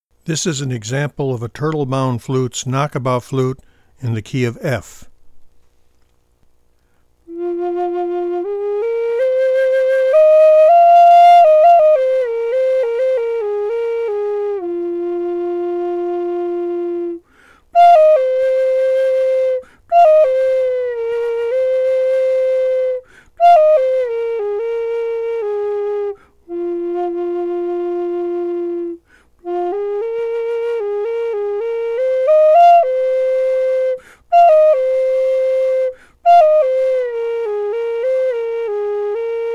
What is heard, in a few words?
Knock About F, flutes